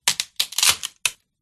breaking wood dry wood break small tiny twig branch snap crack; silverplatter audio